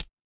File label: ui click